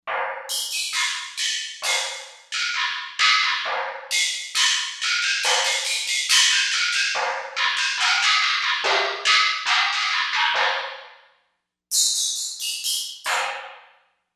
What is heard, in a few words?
Industry, Korg M1Rex; Factory Patches